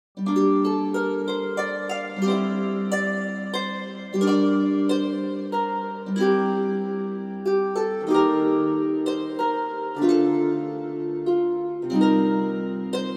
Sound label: pilgrimage ancient mediaeval harp